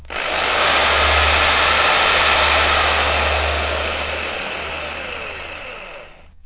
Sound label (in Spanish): taladro largo; primartis; sonidos